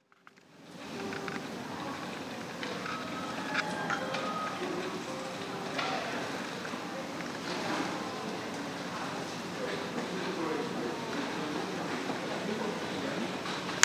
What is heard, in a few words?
small sounds